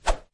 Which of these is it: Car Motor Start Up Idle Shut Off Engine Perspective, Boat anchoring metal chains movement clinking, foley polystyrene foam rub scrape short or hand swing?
hand swing